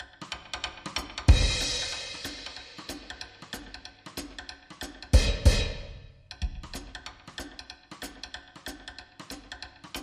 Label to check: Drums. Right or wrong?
Right